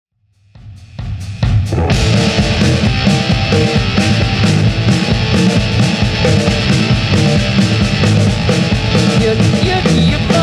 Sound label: Indian Song